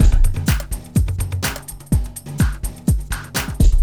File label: afrobeat 125